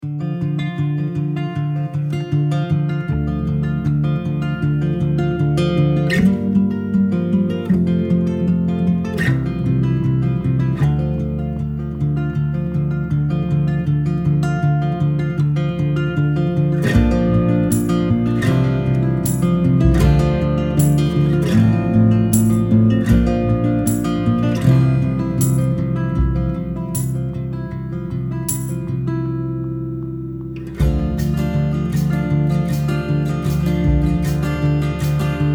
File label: Score Emotional; Der Lehrer